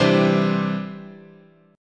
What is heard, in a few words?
C Major Add 9th, guitar chord